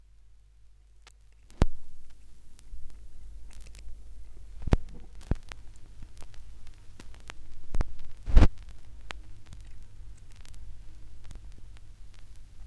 schallplattengeraeusche mix rev

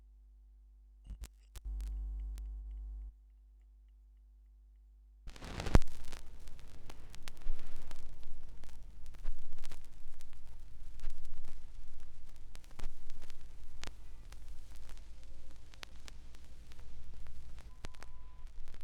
Schallplattengeraeusche

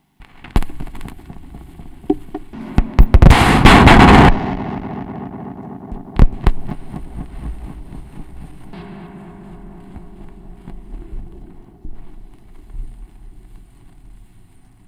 schallplattengeraeusche, Tape Echo Mix

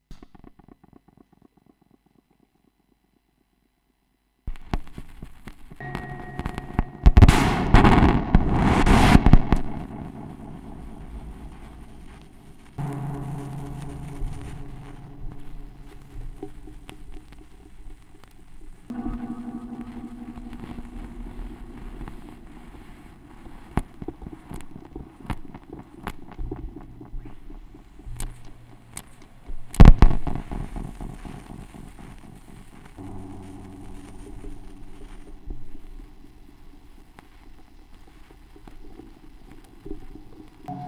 schallplattengeraeusche, Tape Echo Mix

